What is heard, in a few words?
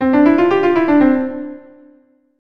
Tap